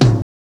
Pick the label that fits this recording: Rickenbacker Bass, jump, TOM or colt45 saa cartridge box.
TOM